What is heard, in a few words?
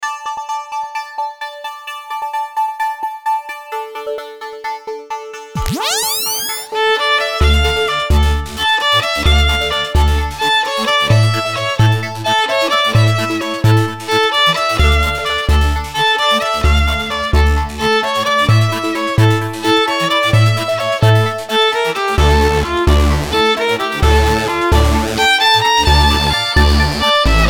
symphonic dance, violin